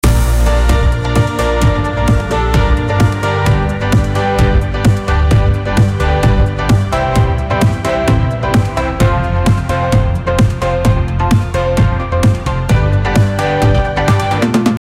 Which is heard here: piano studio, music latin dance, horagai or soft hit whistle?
music latin dance